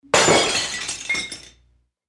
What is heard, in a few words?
China Bowl Smash